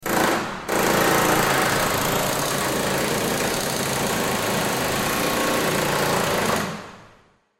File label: Impact Wrench Pneumatic; Power Tools (Sound Effects:Tools); Blastwave